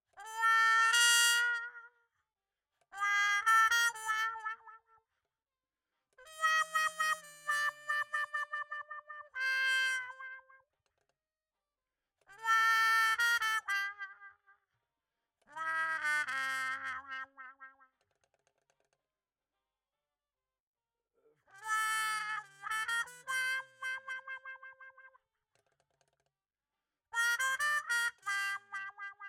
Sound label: Trump; RE20